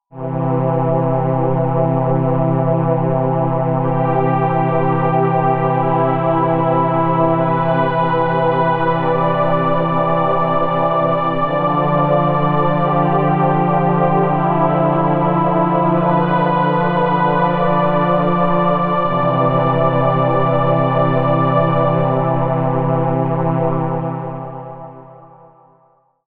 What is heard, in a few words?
Akai MPC 2.10 Solina